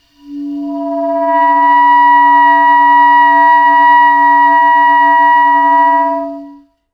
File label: clarinet multi mono; OM-SoX hoaencode